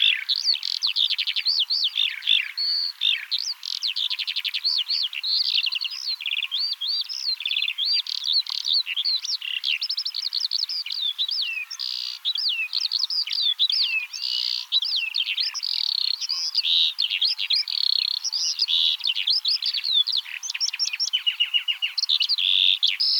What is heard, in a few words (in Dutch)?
Veldleeuwerik Alauda arvensis